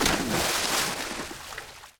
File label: water splash; ambient; Team Fortress 2